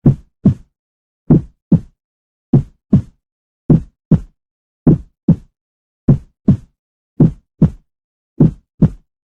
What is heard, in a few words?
heartbeat slow; yttd